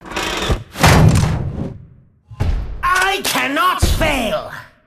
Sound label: ambient port; World Of Warships; port3d